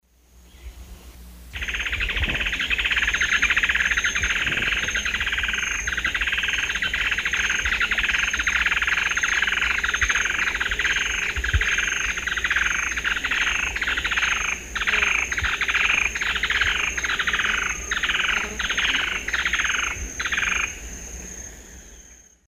Thripophaga fusciceps, group song; S. America; Eastern Lowlands

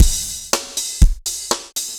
dance floor tight 120, loops

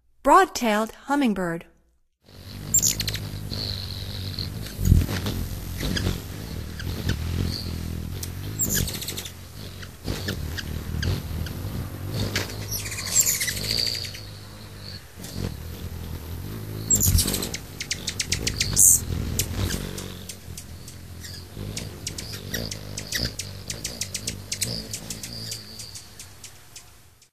Broad-tailed Hummingbird; North America; Stokes

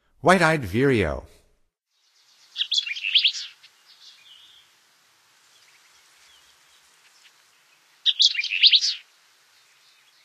White-eyed Vireo, Songs of Birds of North America; Stokes

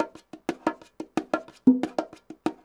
bongos 120b, latin, 120bpm

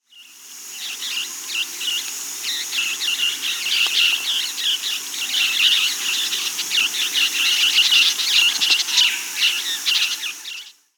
Scarlet-shouldered Parrotlet (Touit huetii); Bolivia; S. America

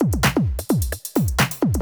electro kit 130

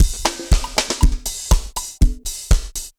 dancefloor perc 120 bpm

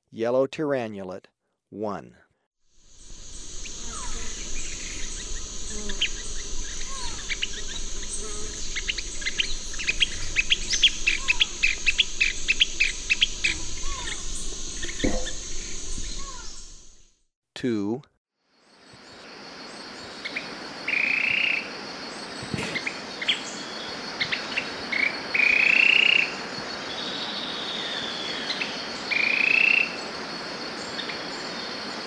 Yellow Tyrannulet, Birds of Ecuador; S. America

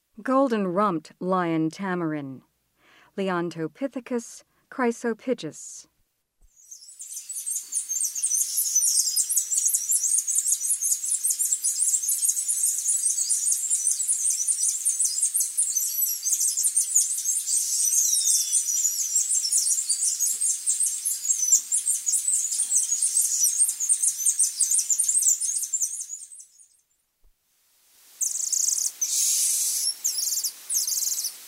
Golden-rumped lion tamarin; S. America; Rainforest

